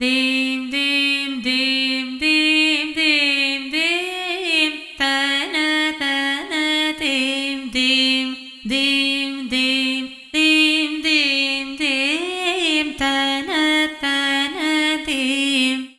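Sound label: 60 C Female Tarana Fx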